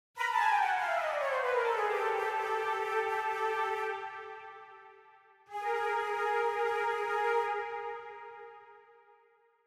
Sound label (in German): Flötenmotiv